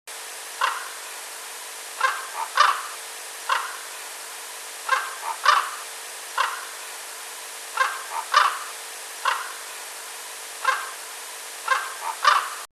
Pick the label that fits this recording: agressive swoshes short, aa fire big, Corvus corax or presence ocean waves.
Corvus corax